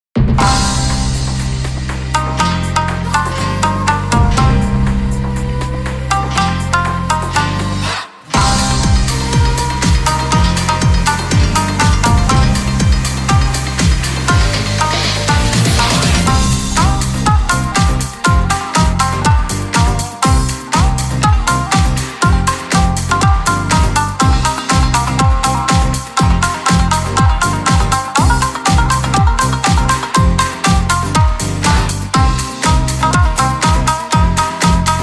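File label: Heartbeat, game music; Ryu ga Gotoku Ishin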